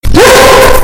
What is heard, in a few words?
bark loud